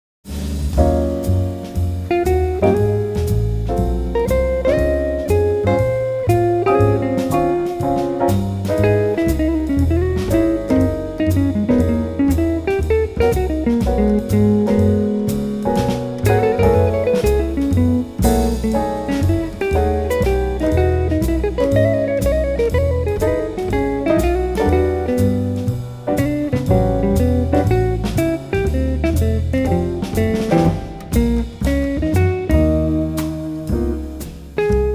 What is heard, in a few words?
Electric Guitar Jazz